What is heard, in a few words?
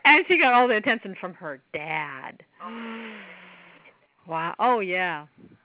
vocalized noise